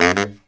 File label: Killer Brass Riffs, BARYT; USB Soundscan vol.29 - Killer Brass Riffs; AKAI